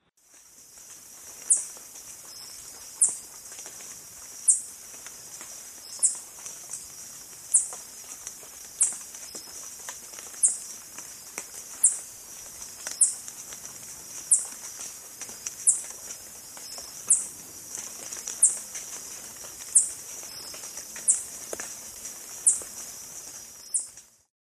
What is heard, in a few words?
Buff tailed coronet; پرنده تاجک دمنخودی